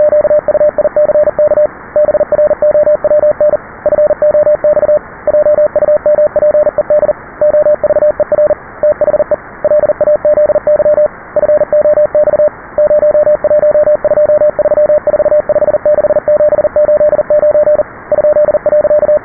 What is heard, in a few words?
morse test 20db